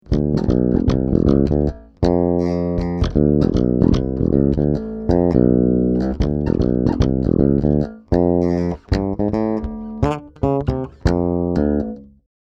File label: bridge, bass; fame baphomet